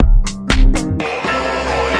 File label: 120 bump bump ahhh, sampled music loop; SAMPLED MUSIC LOOPS